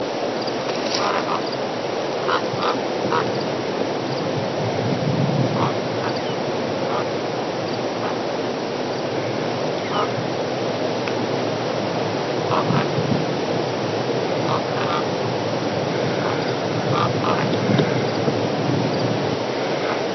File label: Three Common Ravens